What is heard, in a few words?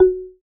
Marimba; Realme 8